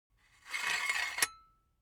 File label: object tool silicone gun empty pusher push slow; smartsoundfx